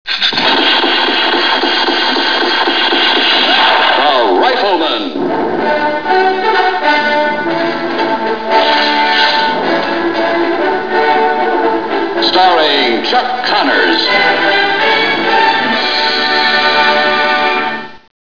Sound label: rifleman